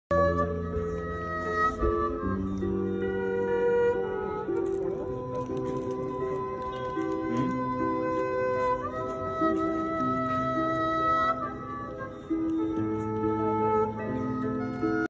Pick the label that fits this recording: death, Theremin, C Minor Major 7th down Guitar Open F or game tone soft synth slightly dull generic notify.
Theremin